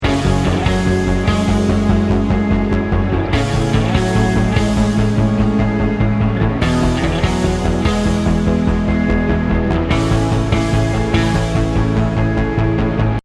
start round, music

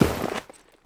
snow, footsteps

